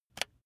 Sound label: razor attachment retract soft